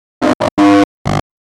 sound of arriving somethi; arrival

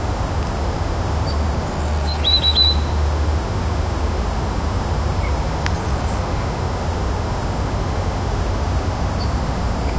realistic natural garden

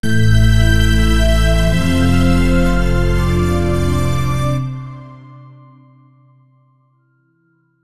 logo, corporate

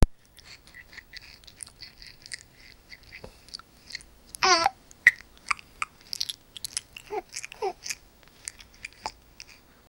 the sound of a baby, grimace

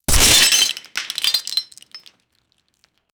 glass cracking impactful; materials

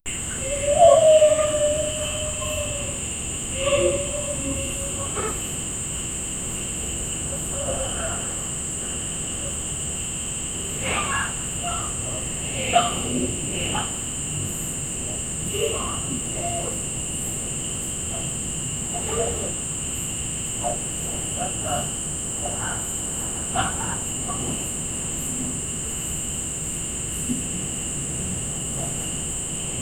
halki hawa jungle

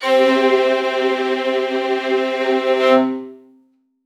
epic cinematic staccato strings

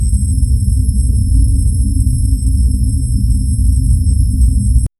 gentle crystalline chime; low wind

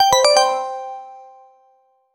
messaging positive confir, clear

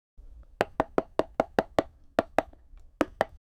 punch sound of beating

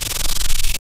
big spider bite

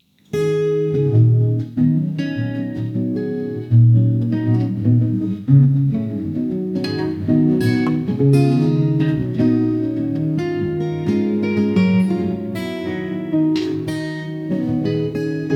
soft lo fi acoustic guita

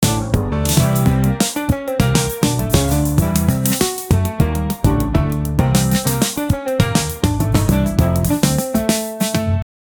la la la funk music

